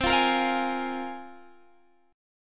C 7th Suspended 4th Sharp 5th, C, down, Guitar, Drop D